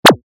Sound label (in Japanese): キャンセル